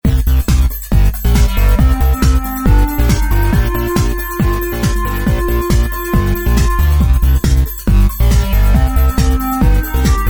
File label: Kick Shock